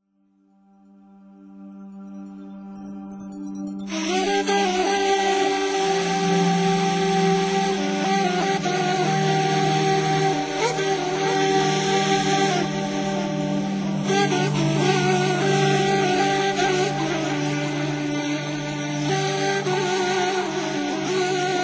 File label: Ney